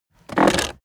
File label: car accessories armrest open